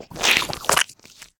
Ooze Attack; SyrinScape

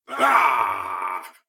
Combat; Eshimal; SyrinScape; Snarl and Oraculum

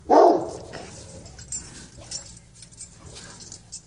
Big vicious hounds; SyrinScape; Missing Magistrate

